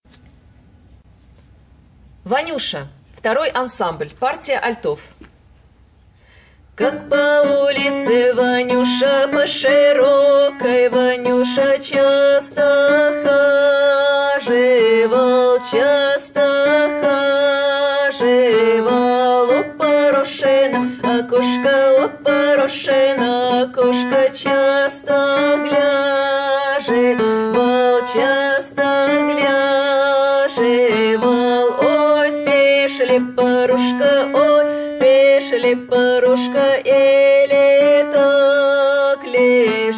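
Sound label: Vaniusha alto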